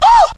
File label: ah